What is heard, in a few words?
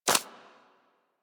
Bird Of Prey Step Dirt; Warframe; Footsteps